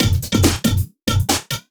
Breakbeat; Loops; Vengeance Samples

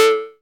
Synths; Vengeance Samples

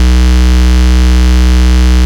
Root B, Ultimate Bass; Vengeance Samples

